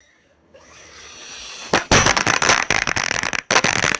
small firecracker cluster popping in plastic pipe

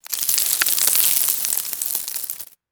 sandy debris falling and scattering

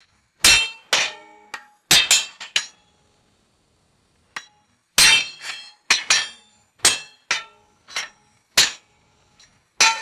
rocks striking resonant metal bars

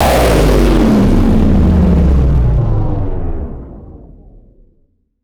sharp synthetic impact and descending sweep; designed

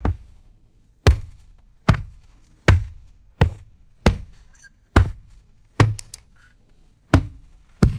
dull fleshy impacts on hollow wood

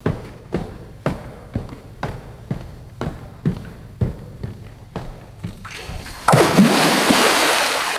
springboard footsteps and splash in indoor pool; water

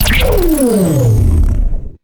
sci fi laser weapon powering down; lasers

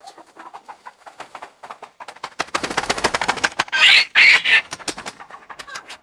chicken flapping wings and cackling indoors; birds

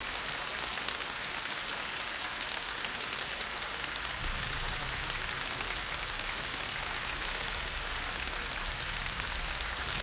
steady rain falling on leaves

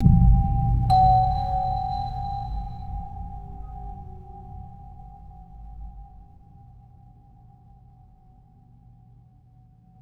muted bell strike with descending resonant hum

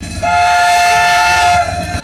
steam train whistle blowing

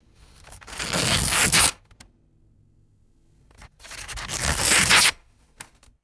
thin paper slowly tearing twice

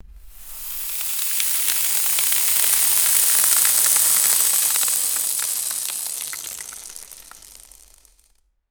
fine sand pouring onto wood

